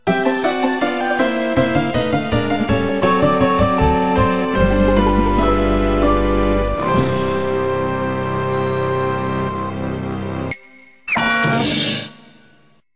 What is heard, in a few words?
ceremony song; Speedway USA